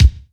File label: crisp bass drum single hit c key